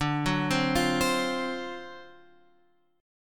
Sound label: D 7th Sharp 9th D, Guitar Open F; D7#9 arpeggiated; x,9,6,7,8,7